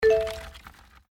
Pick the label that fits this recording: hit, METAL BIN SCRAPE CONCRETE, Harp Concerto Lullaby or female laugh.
hit